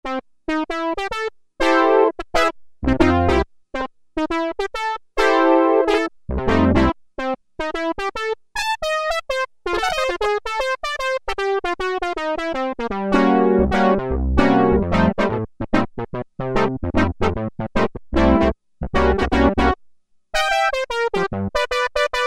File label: Phase Brass, Roland Juno-60; Factory presets